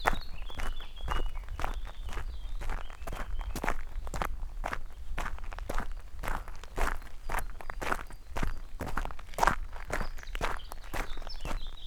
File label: Footsteps On Light Gravel Path Stride